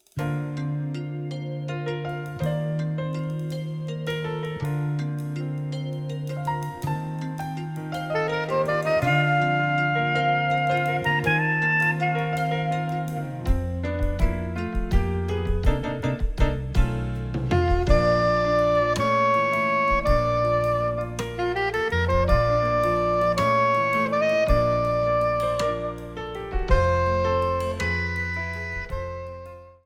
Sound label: Underwater BGM Arrangement; SMB